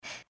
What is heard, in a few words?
breath; pony; Twilight Sparkle